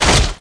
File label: gib zombie; SAS: Zombie Assault 4